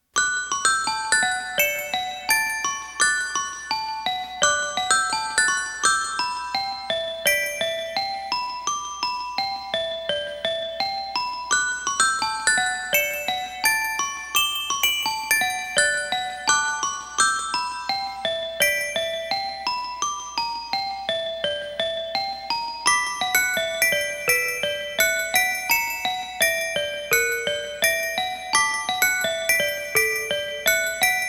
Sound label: Music Box; TwinBee RPG